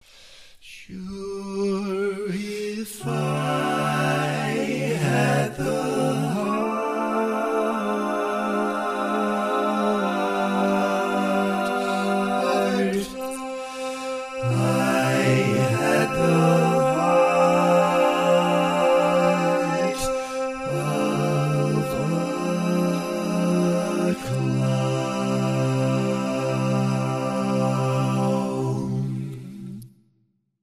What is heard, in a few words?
All Parts, Barbershop Tags, Slow, Chord-Worshipping version